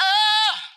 "bard", Voice

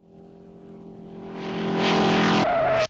plane land skid

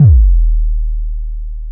kick, Heartbeats

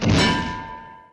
anvil; Crash Bang Boom

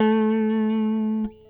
slaughter, Notes, Guitar

